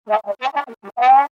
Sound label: wah wah